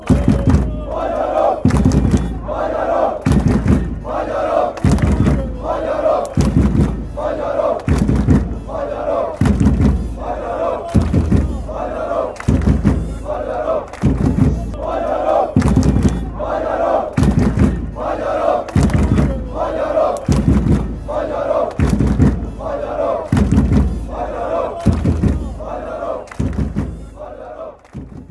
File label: drums hungary fanchants